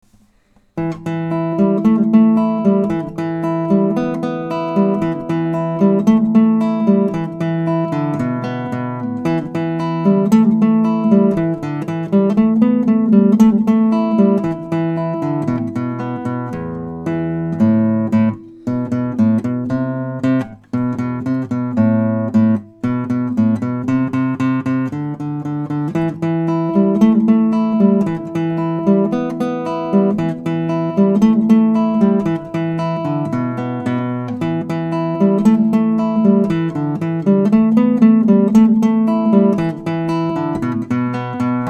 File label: Rock